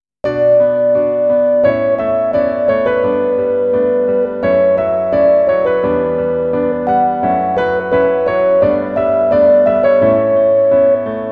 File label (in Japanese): instrumental ver